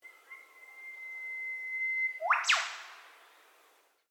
Bird Eastern Whipbird B call, Australian Native Birds; Animals